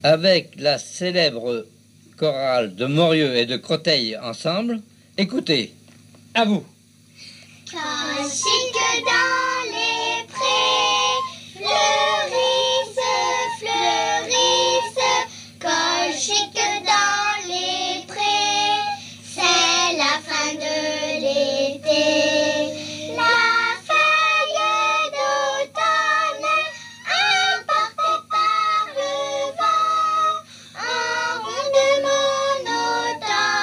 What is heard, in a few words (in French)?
Chorale; Chorale Morieux et Créteil; Colchique dans les prés